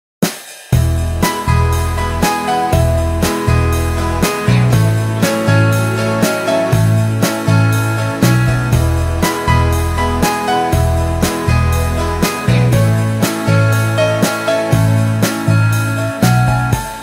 nokia ringtone band

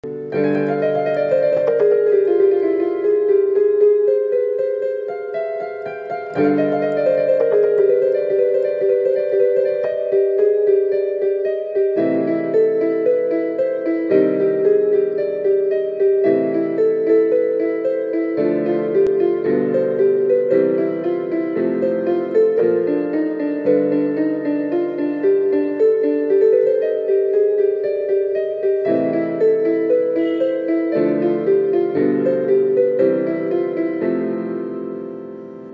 bgm keyboard cover; mouna ragam

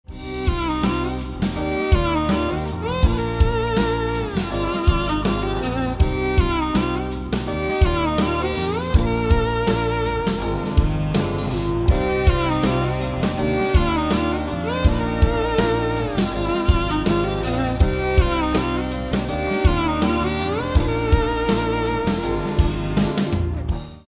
song bgm ringtone instrumental violin

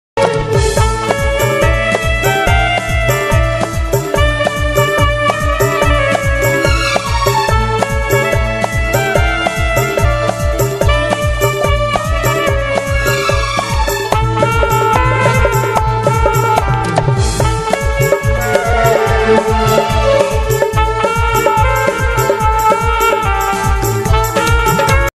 ringtone saxophone

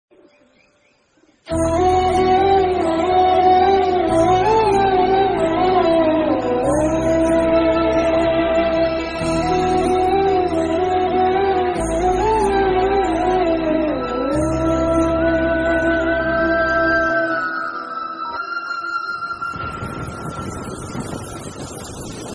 sad ringtone sad bgm flute instrumental ringtone; south movie; rider movie

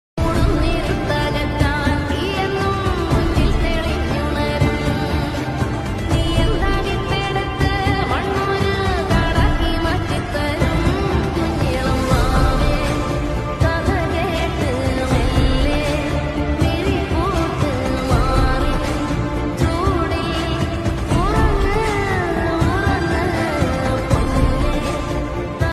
Malayalam bgm ringtones

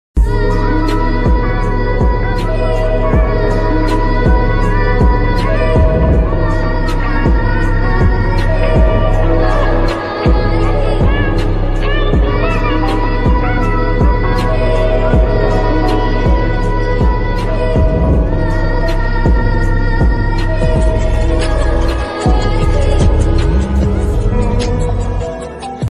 hindi ringtone, ringtone song, sad ringtone

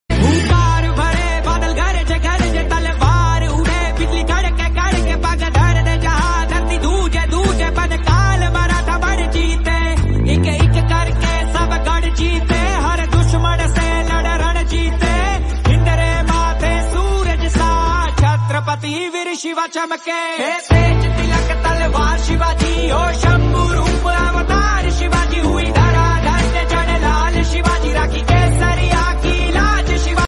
bgm ringtone; chatrapati shivaji maharaj

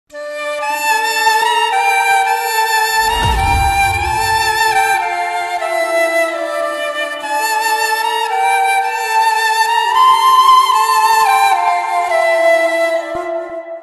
ringtone; prithvi vallabh

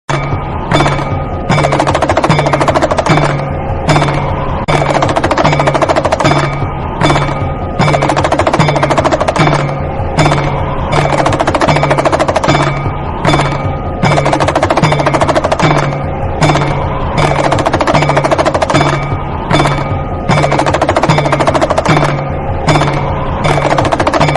shiva damaru ringtone; Lord Shiva Shankar; bhakti bhajan

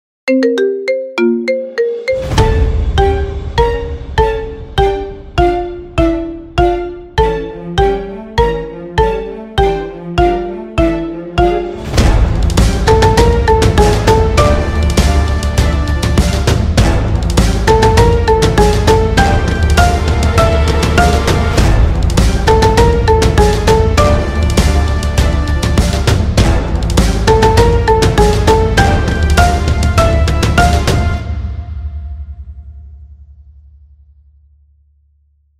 theme marimba ringtone; goku ultra instinct; dragon ball super; 身勝手の極意

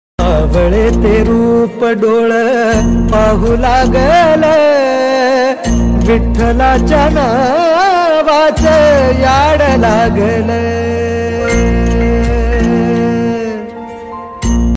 god ringtone marathi ringtone; Vitthal; Pandurang ringtone

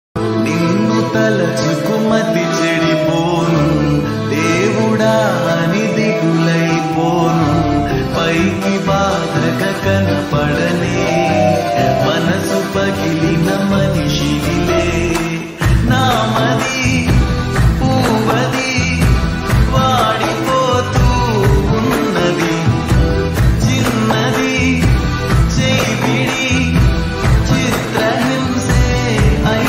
telugu love songs

